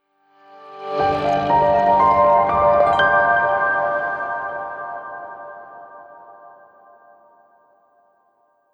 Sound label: Corporate Transition